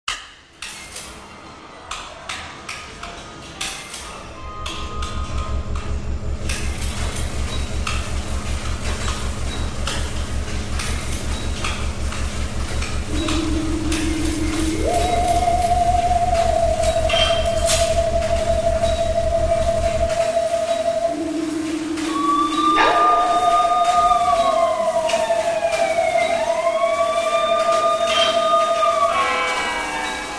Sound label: Theremin